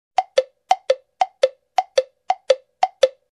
Jumping woodblock rhythm

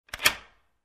Door lock click sound

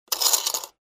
Coins in Coin Box tinkling

